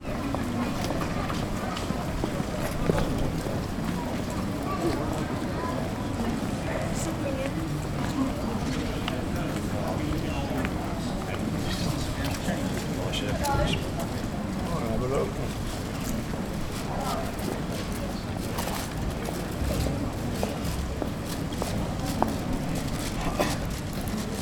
ambience public place town centre pedestrians short uk